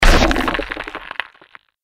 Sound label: mirelurk injured; magmalurk; npc